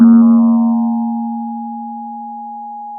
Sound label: Sound of a church bell